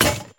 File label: delete